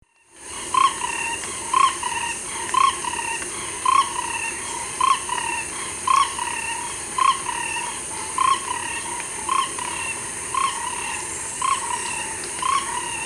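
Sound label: ingerophrynus quadriporcatus, Four-ridged Toad call; Venus Drive, Singapore, 02 Nov 2016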